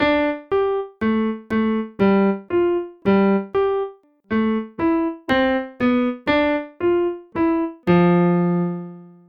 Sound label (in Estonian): klaver